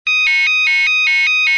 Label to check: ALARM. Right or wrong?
Right